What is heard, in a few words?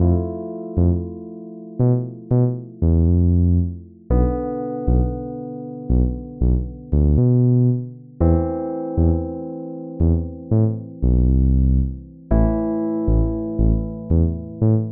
Looking Back Groove